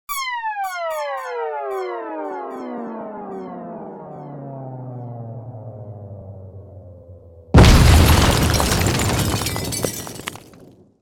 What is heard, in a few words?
space ship explosion